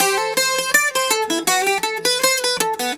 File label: Celtic Flavours; USB Soundscan vol.42; AKAI